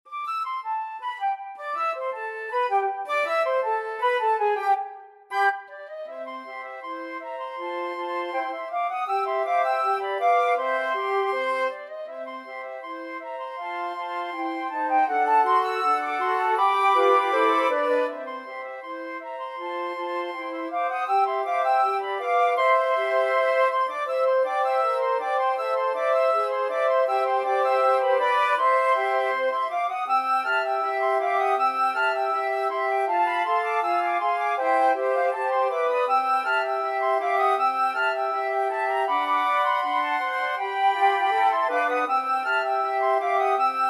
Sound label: flute choir